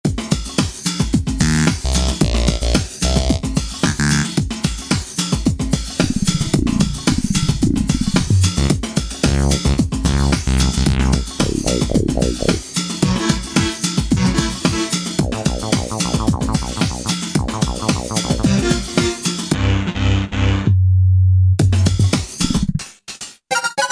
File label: Bubble Kit; Alesis Rap Techno Dance